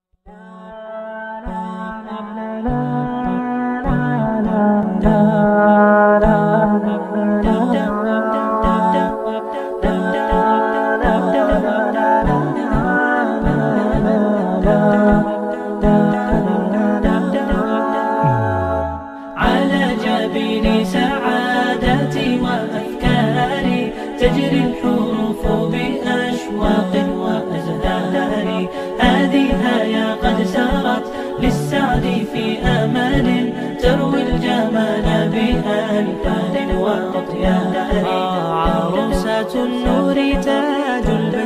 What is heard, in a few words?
nasheed wedding